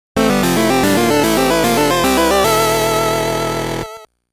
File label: Game Over; R-Type Original Sound Box